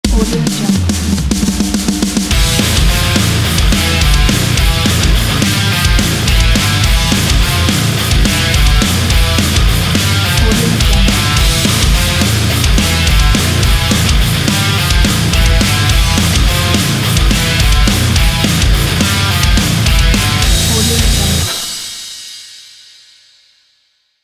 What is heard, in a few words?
heavy metal ident